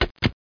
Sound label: lever dn; lands